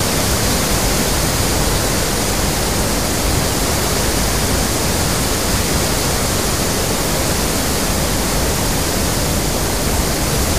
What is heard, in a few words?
Waterfall From Guri Dam, Venezuela